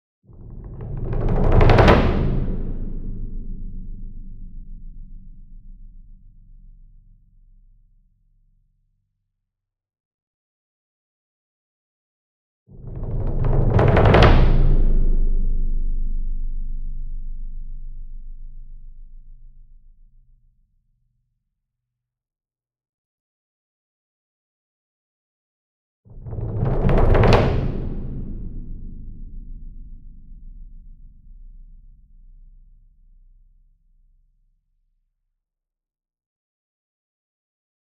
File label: SINGLE DRUM Bass Drum Concert 40 Inch Roll Soft Beater Medium; boom library; Cinematic Strikes Construction Kit